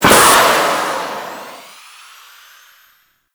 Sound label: Movement Forward Transition; Vehicles; Archwing